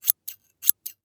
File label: Household Scissors Open And Close Multiple